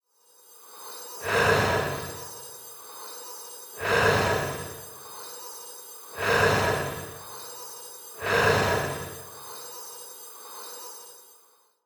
Wind Guardian

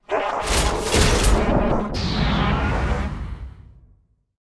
dead, monster

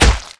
hit leather

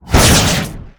skill explo

